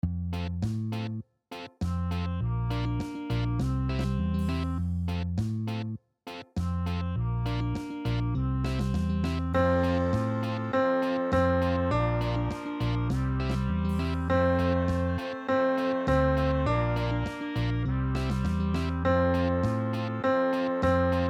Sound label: reggae